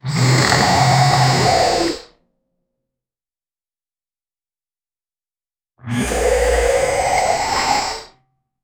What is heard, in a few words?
mechanical cybernetic mol, cybernetic